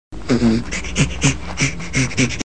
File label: chimpanzee